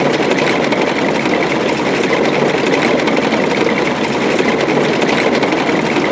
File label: tracks, leopard